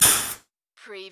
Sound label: Pneumatic Valve